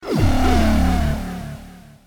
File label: power unplug